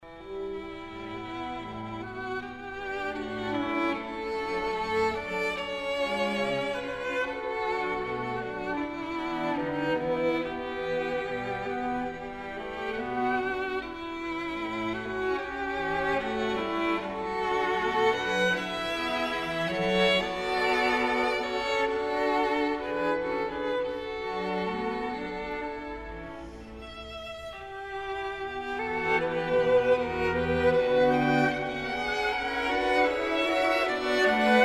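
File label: Phantasy quartet; Spain-Dunk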